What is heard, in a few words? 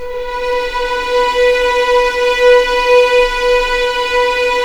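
B4 legato violin, right, The Ultimate String Collection; East West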